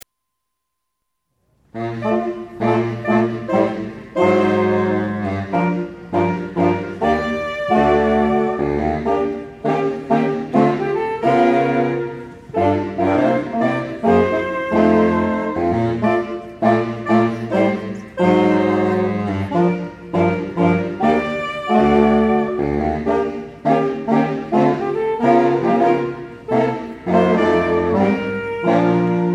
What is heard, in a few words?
Jolly Old St Nicholas; christmas